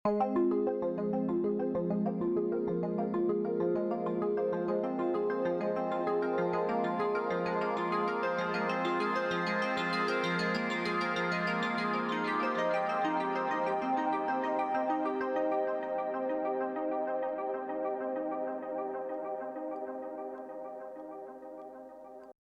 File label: Centred Arp Better Mixdown